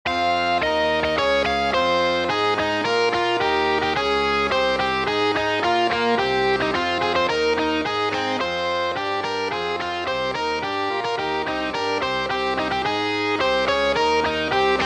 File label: short sci fi suite